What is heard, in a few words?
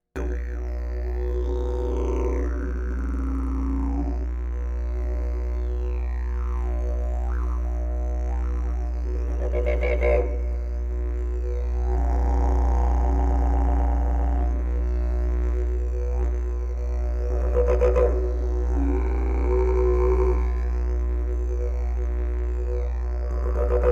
didge